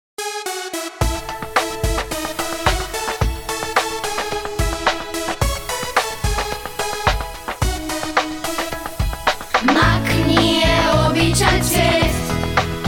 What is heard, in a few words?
JINGLE